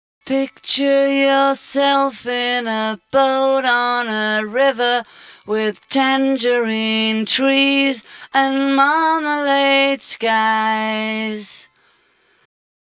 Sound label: vocals sped up